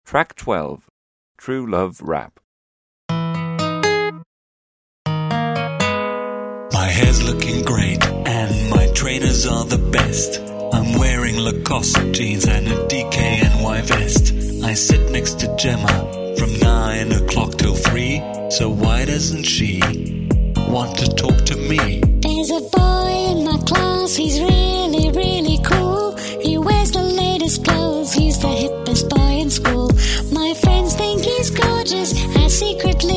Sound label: rap